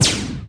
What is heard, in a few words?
Pulse Laser; WarZone 2100